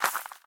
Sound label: Snowball Take; miside demo windows gamerip 2023